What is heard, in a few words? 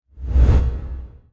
SWOOSH [BASS]; SFX Royal